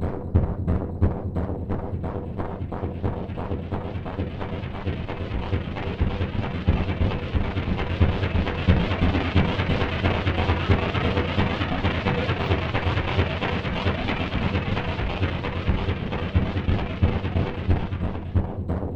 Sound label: MACHINES; Zero G; Malice In Wonderland